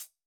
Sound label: hihat, Acoustic